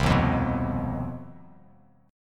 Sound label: C Minor Major 7th Double Flat 5th C down Bass Standard; strummed; JGuitar